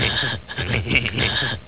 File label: laugh